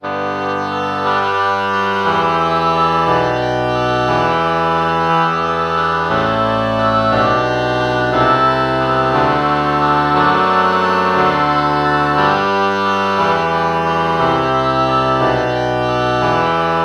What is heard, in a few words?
oboe oboe lpc false bach morph